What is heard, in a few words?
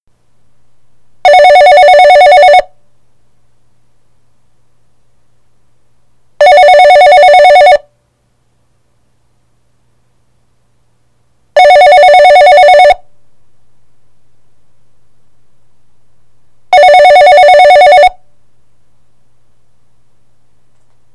Warble Tone, biax tone ringer